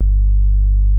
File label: MOOG LOW G2, ANALOG BASS; Music Store - Claudius Diemeter Schwerlos